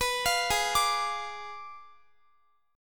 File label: Ab Diminished 7th Ab, Guitar, 4E, 4A, 5D, 5G, 5C, 6F; Abdim7; arpeggiated; x,x,21,22,20,21